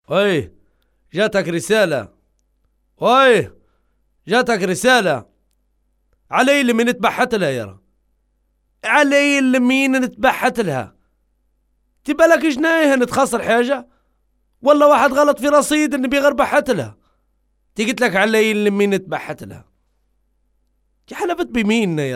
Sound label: libyan sms tone; ringtones